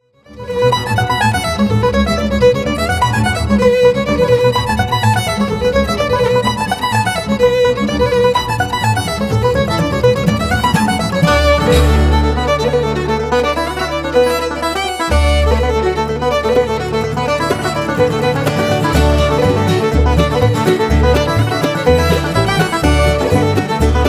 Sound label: Irish Medley